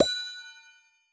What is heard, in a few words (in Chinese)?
试炼刷怪笼弹出物品声